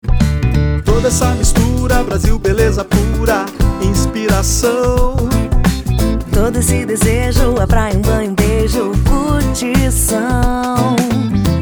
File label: Jingle Versão Rádio; Rala Bela